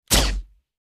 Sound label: Silenced Single Gun